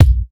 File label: original kick drum b key; kick drum samples